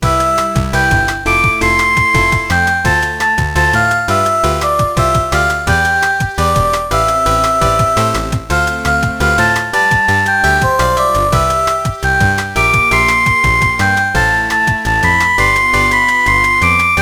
Happy Smiling Short Ringtone Version; My Happy Manner Book Happy Music Collection

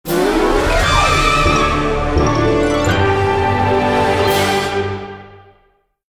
Win Stinger; Shrek the Third; PS2

